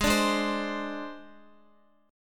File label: Ab Major Flat 5th Ab down Guitar; AbMb5 strummed; AbMb5 chord; JGuitar